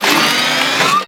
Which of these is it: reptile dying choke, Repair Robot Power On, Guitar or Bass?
Repair Robot Power On